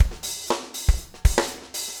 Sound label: 4 on floor 120, loops, 120bpm